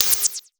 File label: twitter, Prophet Five, Analog; E-MU Formula 4000 Series Vol. 3; Analog Odyssey